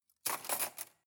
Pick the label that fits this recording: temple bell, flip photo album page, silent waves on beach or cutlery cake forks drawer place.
cutlery cake forks drawer place